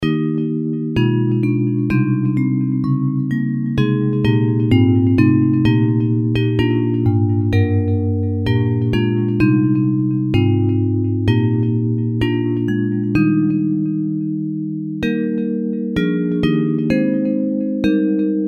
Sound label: Bells, Hymns; Lead Me Lord